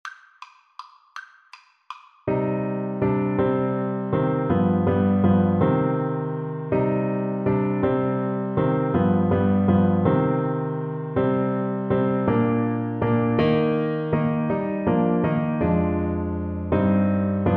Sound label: we three kings, Trumpet